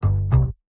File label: Bass, Jazz for piano